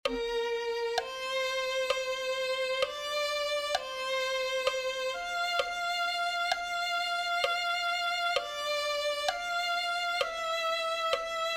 la senda del justo violin

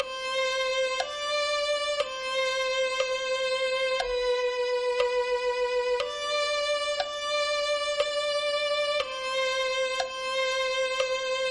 oh señor clamamos ante ti violin

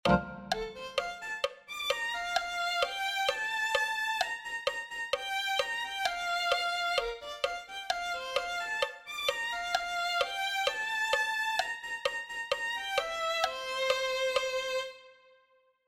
viene una grande gloria violin

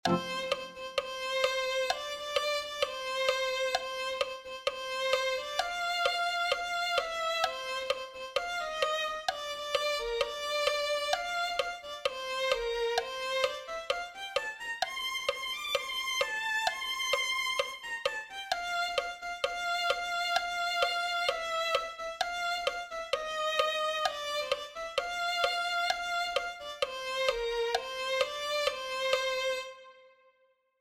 deja ir a mi pueblo violin

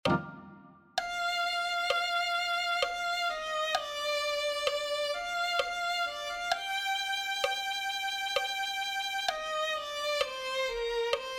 llevame a la roca violin

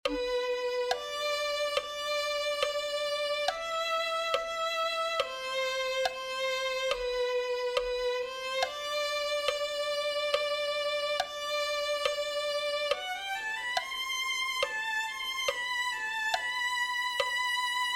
violin1

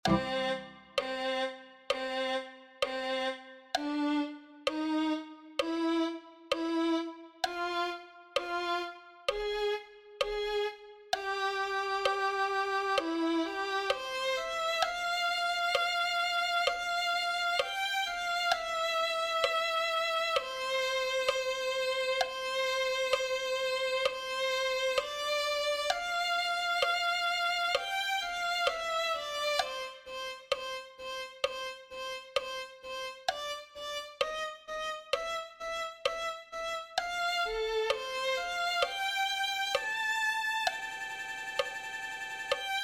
violin1

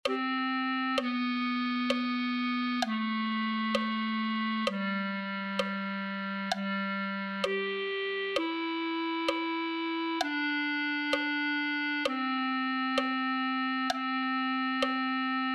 clarinete

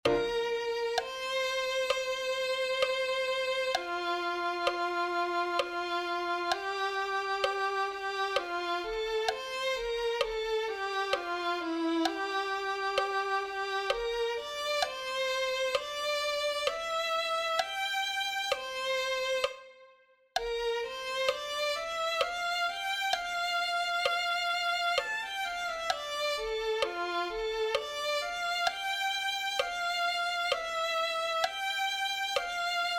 violin2

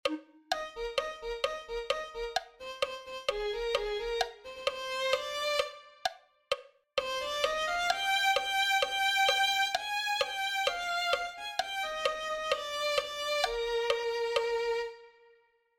violin

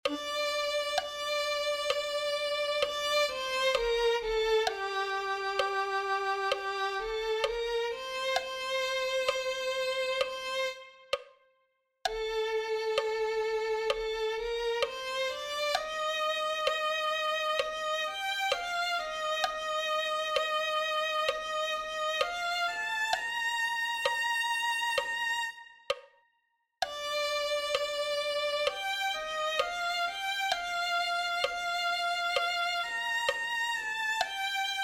lo he visto y conocido violin

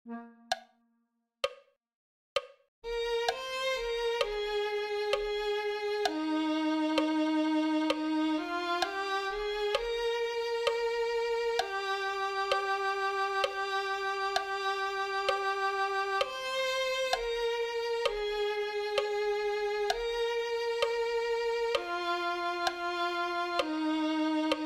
mi alma alaba al señor violin

